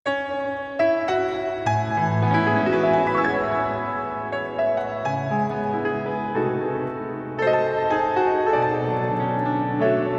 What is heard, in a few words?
Jazz Latino